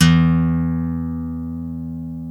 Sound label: GTR, Dual Guitar; Club-50 - Foundations; Paul